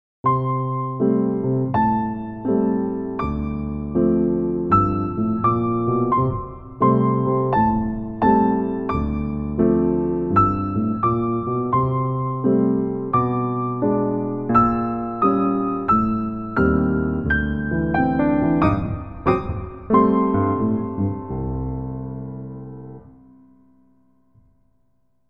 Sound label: Jazz Walk Duet